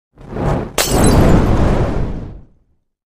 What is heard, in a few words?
Molotov Cocktail